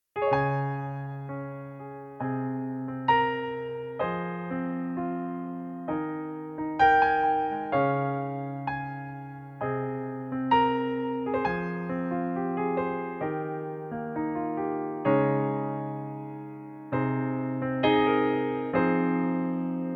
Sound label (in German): Pianoplayback Ab-Dur